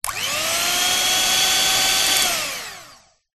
household food mixer hand held electric on off med burst